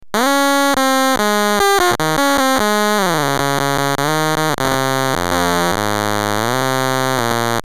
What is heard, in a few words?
Psynth no Lfo